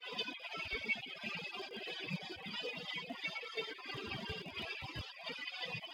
Groove DUET

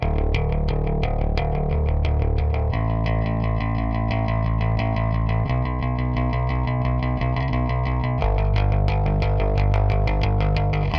groove

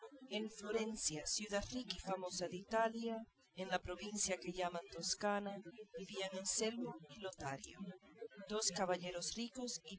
female

